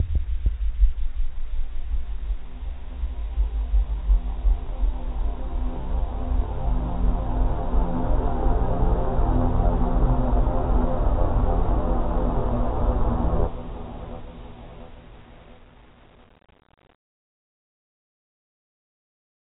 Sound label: kowai sound